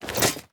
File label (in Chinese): 装备下界合金盔甲声